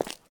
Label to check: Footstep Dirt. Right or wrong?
Right